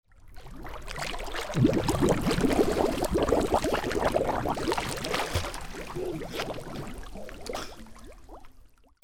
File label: WATER